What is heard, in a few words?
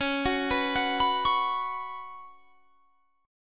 C sharp 7th Suspended 4th C sharp; C#7sus4; arpeggiated